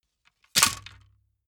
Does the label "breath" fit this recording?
No